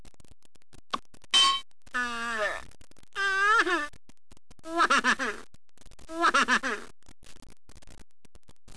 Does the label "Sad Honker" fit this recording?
Yes